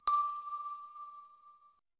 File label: minimal ta da soft